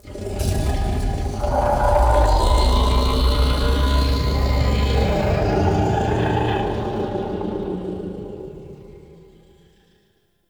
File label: Growly Vocal; Ambience; Warframe; Man In The Wall